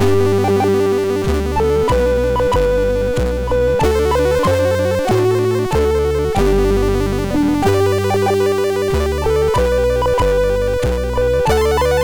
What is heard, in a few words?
German Xmas tune sid MOS6581R2; hvsc